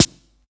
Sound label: HH Snare